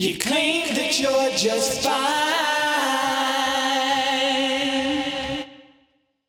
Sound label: vocal phrase u claim that youre just fine 131 Amin